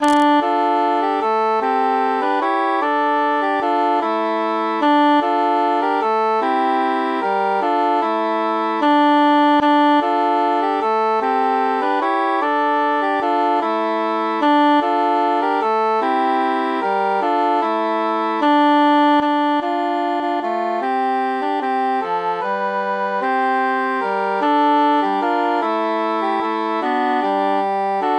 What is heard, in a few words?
three country dances transposed tenor mute